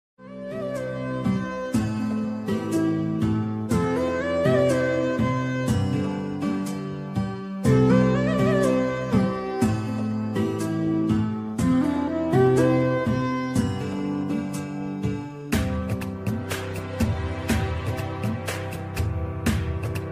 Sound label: ringtone background music ringtone bgm; sabse badhkar hum 2